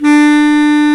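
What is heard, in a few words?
Bass Clarinet, clarinets; Lo Clarinets; Roland L-CDX-03 Disk 1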